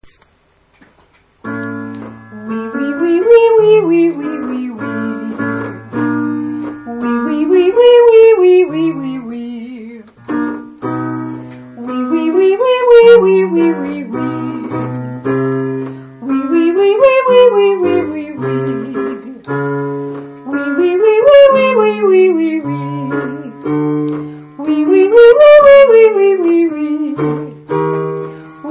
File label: 4 note arps on 1 - V7 Wee asc, Singing Exercises